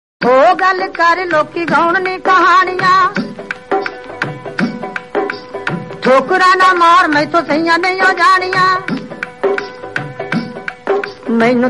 punjabi song

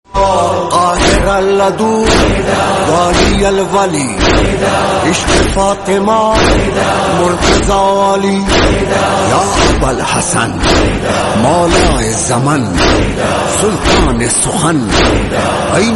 noha islamic song

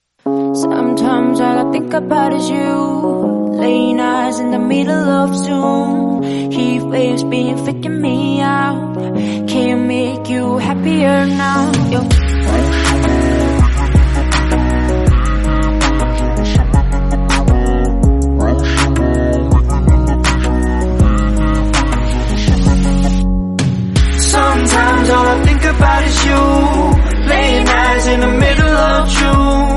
english slowed song

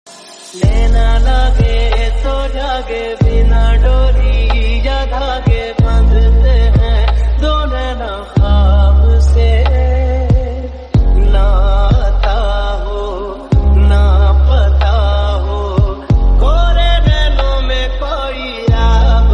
lofi remix

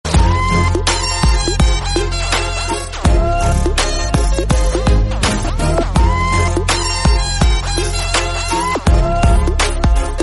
instrumental remix bgm tamil

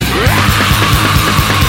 raaaaaaaaah

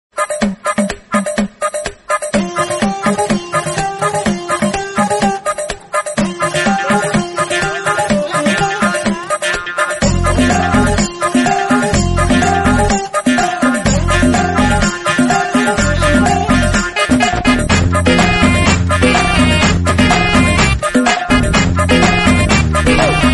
funny comedy bgm

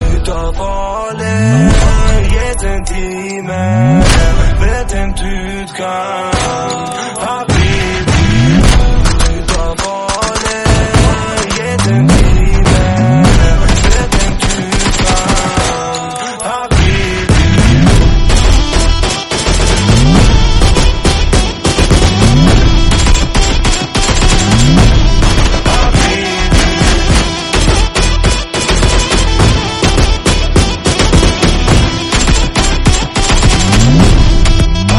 beat sync

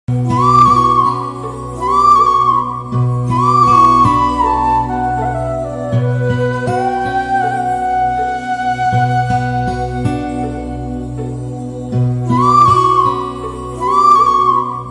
south indian flute; googly

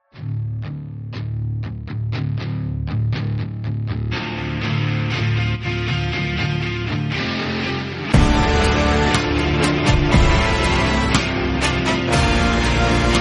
bgm tamil; gandhi mahaan; vikram

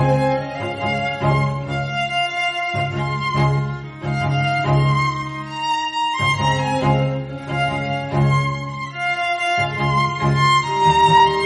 piano instrumental song

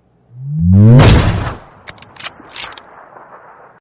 bass sound bgm; awm; pubg

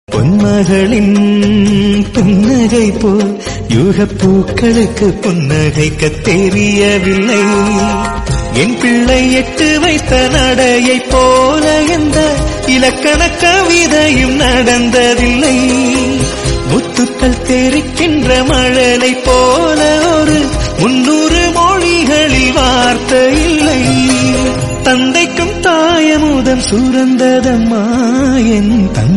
Tamil Mother Love Ringtone

